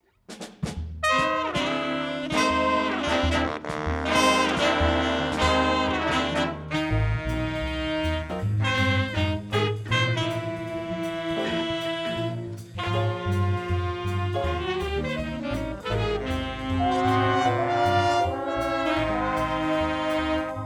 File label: jazz band